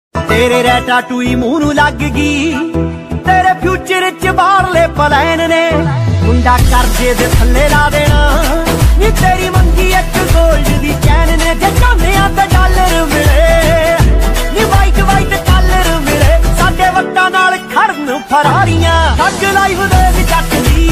punjabi ringtone hindi song ringtone love ringtones mobile ringtone punjabi